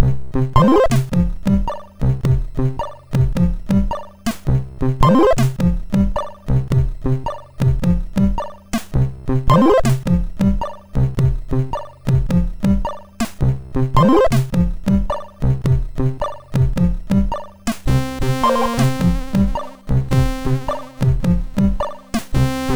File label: Intro, MOS6581R2; Paagen